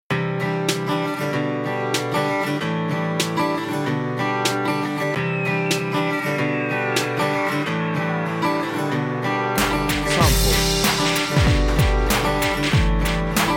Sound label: rythm